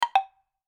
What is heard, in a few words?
notification